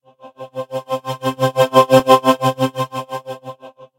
intro Giant Hand Light; todee and todpee

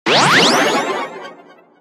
buy click; aviamaster; Aviamasters

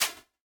Drop

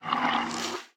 water Suck; todee and todpee

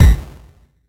explosion; todee and todpee

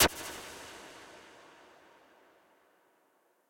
intro Glitch Disappear; todee and todpee

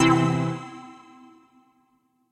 intro Worlds; todee and todpee

